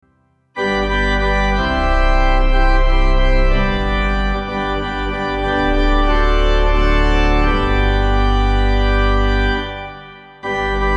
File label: Pipe